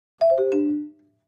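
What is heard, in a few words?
Clavichord Short Descending; keyboards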